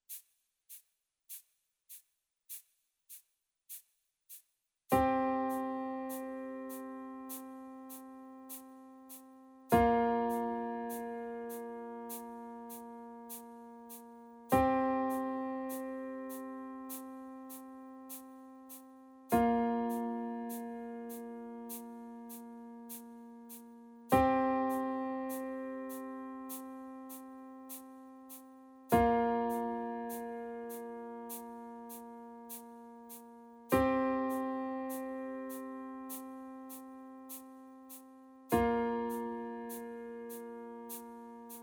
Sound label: Arpe